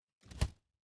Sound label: building destruction stinger